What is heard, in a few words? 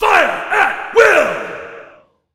Activated Artillery Command